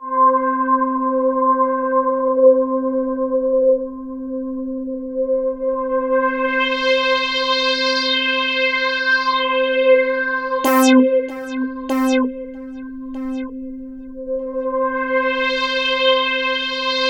C5, Synth Line; 90 s Sample CDs; NiceBeats; SynthLine VOL-25

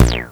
Abrassive Filtered Bloop, MultiMoog

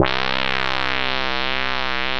SYN, 168 BPM, Drum & Bass; Ueberschall; Drum & Bass Flash Trackz

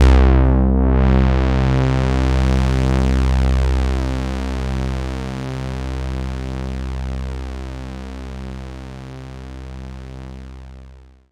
C2, Synth Line; 90 s Sample CDs; NiceBeats; SynthLine VOL-31

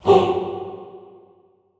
HOO F2, classical choir; Best Service; Extended Classical Choir; SHORT HOO

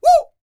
A YELL, MALE HUMAN; ILIO; Vocal Planet

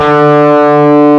D-2 4 OVER D, GUITAR; AKAI S-Series CD-ROM Sound Library VOL-8

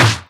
Snares; Vengeance - Essential Clubsounds Vol.4

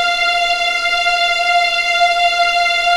F5 EXP MF, VIOLINS; East West; The Ultimate String Collection

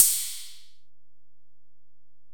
Ride, Alesis DM-PRO, Drum Machines

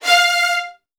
VN 16TH, 1F2; ILIO; Kirk Hunter

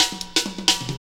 Drum Loops, 165.5; Zero-G Jungle Warfare 1, 2 & 3

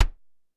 Drum X Kick, Pearl Drum-X

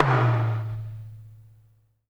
LO-FI KITS; Spectrasonics; Burning Grooves CD2

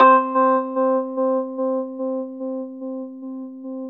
Chor Rhodes, C; E-MU Producer Series Vol. 5 – 3-D Audio Collection; 3DRhodes; 3DChorRhodes1.5

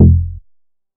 bass classic bunk F; Polyend; ARC Noise

